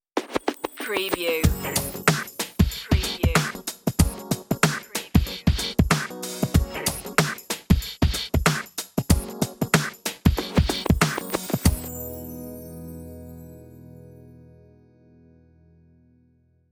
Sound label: 90s hip hop sitcom sting drums and ep only